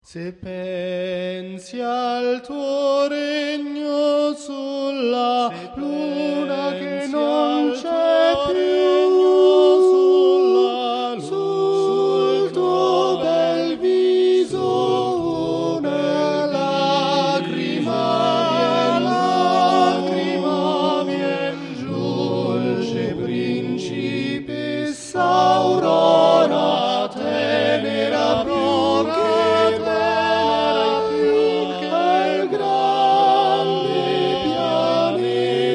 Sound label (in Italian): Coro a Cappella